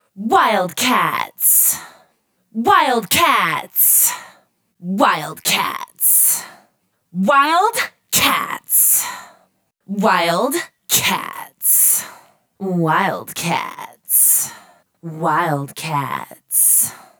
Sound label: wildcats processed vocals